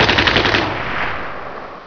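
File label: machine gun burst; ambience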